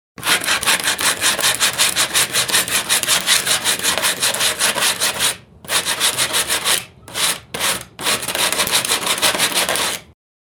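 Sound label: sandpaper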